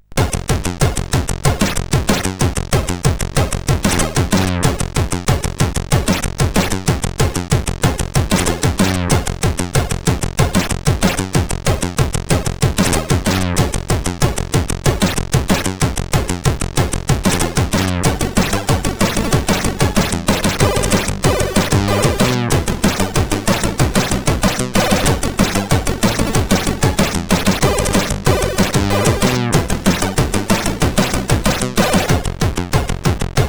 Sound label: sid CSG8580R5